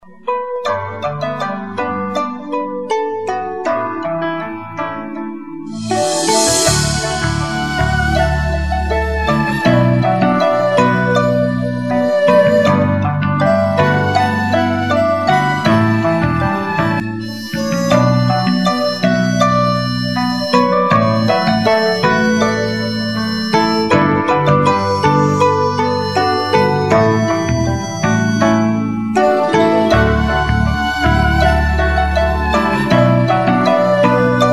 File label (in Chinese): 纯音乐 背景音乐